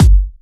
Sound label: Soft Kicks; Vengeance Samples